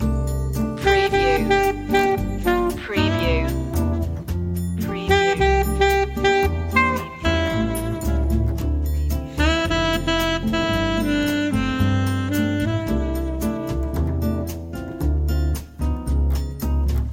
loop

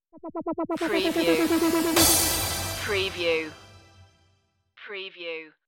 tv stinger

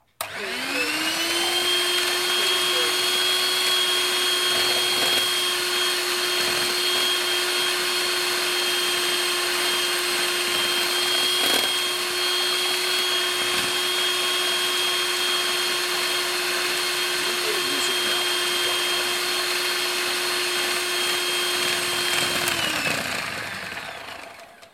Electric Egg Beater

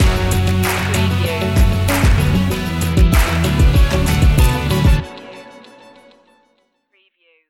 full throttle stinger

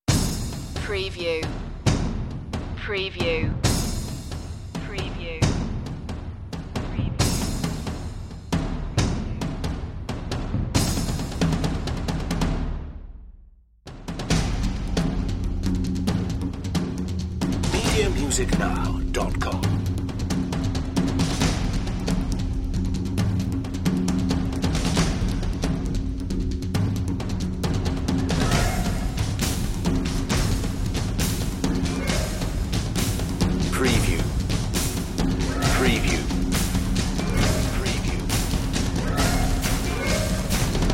crystal cavern percussion; big percussion

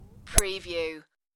Golf Iron Shot

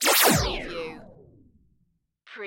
Laser Gun Single Shot; Sound Effects Bible

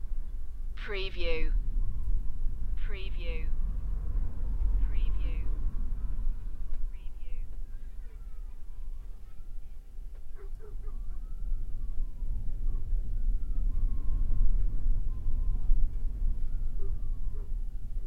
Wind people noise siren from mountain rescue; beanstalk audio

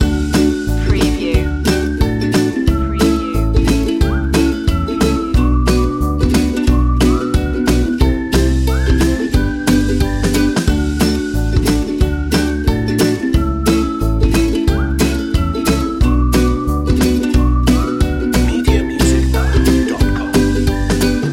uplifting and happy ukelele loop

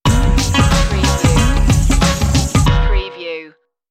groove stinger

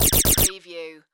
Laser Machine Gun; Sound Effects Bible

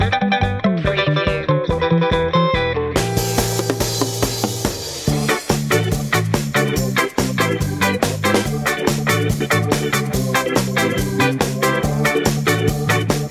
stomp loop

